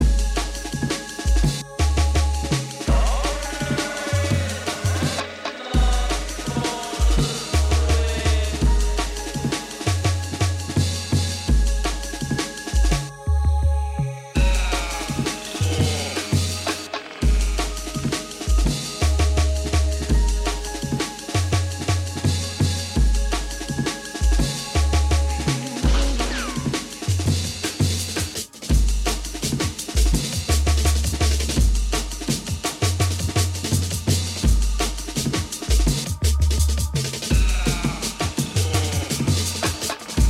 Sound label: drum and bass; RECORDED RADIO